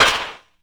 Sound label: WET PERC